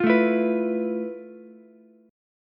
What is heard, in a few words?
F Major Sharp 11th, Ukulele; strummed; JGuitar